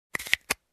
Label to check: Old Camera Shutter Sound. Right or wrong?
Right